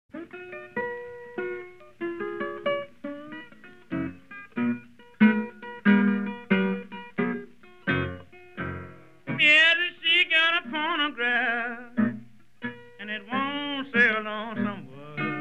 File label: Blues